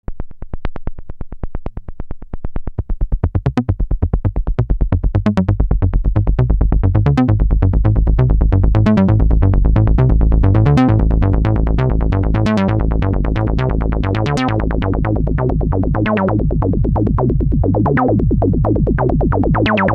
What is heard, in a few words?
env filter sh101; roland